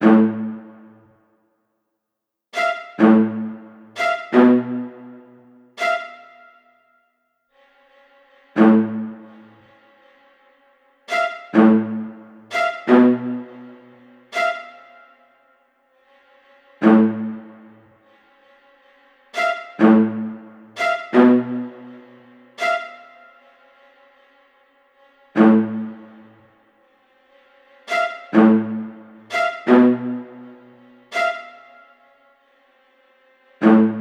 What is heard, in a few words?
Horror Strings